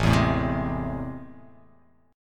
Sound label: A Minor Major 7th, down